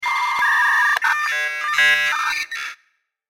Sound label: MODEM MELODY